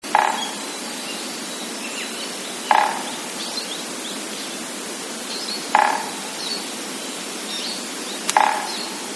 drum

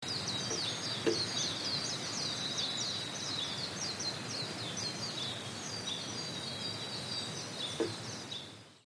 drum; akahara

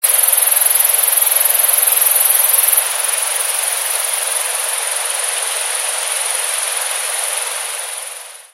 bug

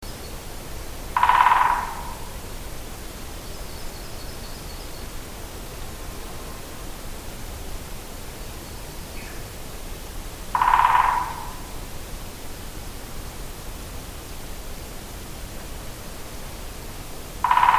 drum

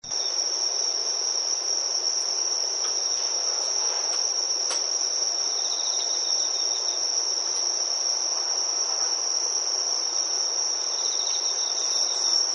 bug

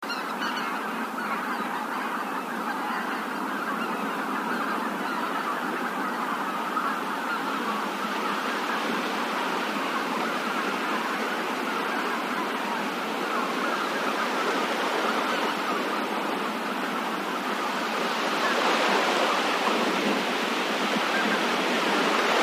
flying duck

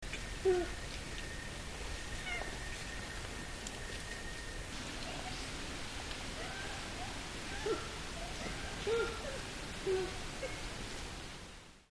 monkey

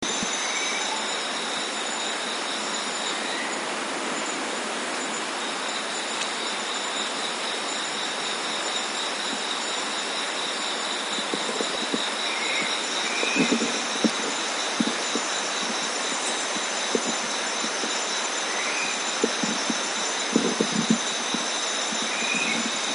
okinawa aogaeru

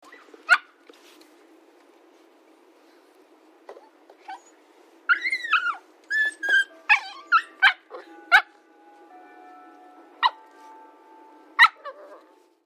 dog

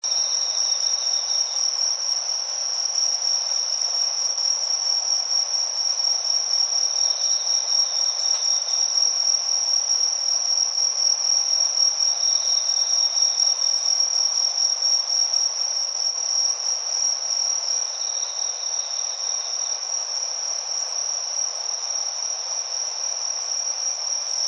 bug

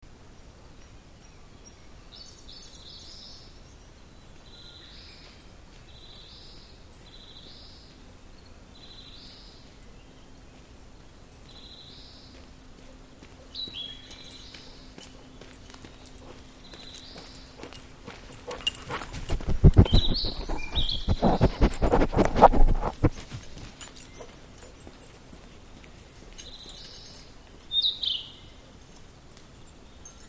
sniff

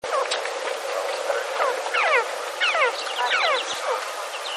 raicho

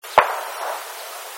shot

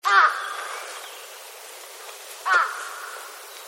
crow

